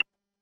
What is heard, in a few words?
soft ui tap